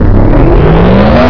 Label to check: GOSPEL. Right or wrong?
Wrong